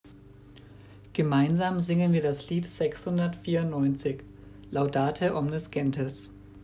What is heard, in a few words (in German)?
Lied